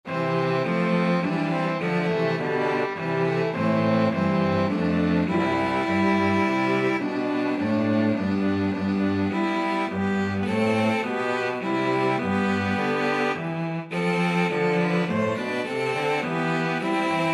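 be thou my vision violin 2 violoncello, string trio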